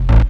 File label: hardcore, BASS, Techno Trance